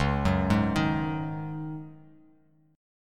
C sharp Suspended 2nd Suspended 4th, C sharp, Bass Standard; arpeggiated; JGuitar